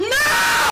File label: combo break; BOIIII idk MIX Skin